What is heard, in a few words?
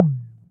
reel stop; Back to 70s; wazdan